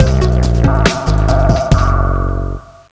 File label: Music Lose; Mechanic Master 2; NDS